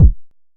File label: analog deep, Kickbank; mpk mini presets; Ableton Foundation FreePacks